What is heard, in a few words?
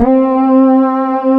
D50 BASIC PAD; East West; Global Sounds